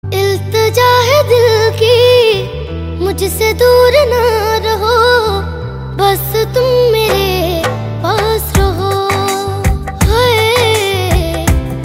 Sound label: Hindi Song Ringtone